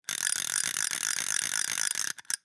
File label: Fishing rod reel fast